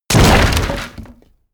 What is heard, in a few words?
break wood medium